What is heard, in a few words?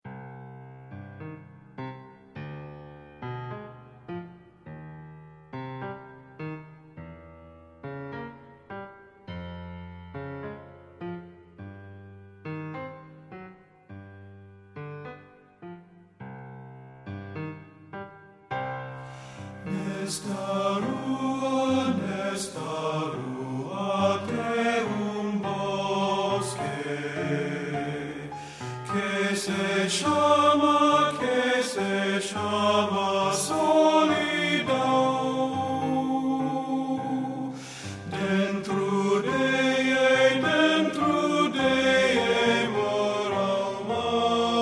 TENOR; men